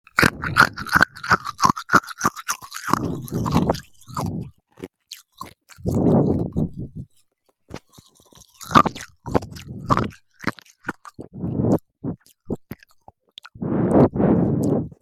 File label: mysterious crunching